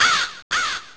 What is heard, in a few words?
Crow